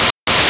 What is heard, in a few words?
walkie noise; DeadFright